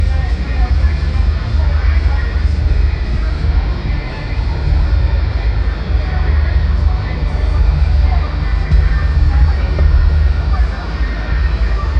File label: strip club ambient sound; nightclub